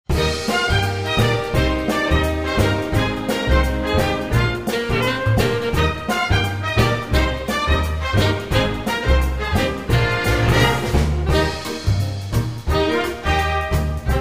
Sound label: Shuffle